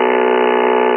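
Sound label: Engine; Buggy; PS1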